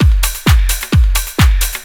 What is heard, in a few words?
groove, DRUMLOOPS